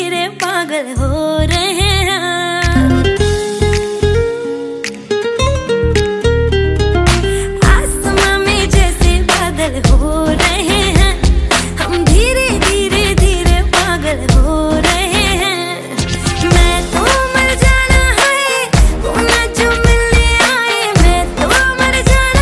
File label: hindi ringtone, bollywood